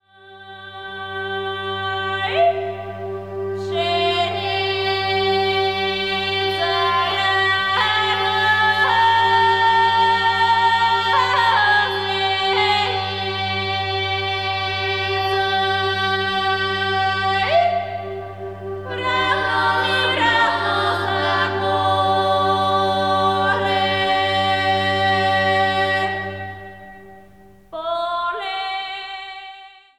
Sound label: female choir, bulgares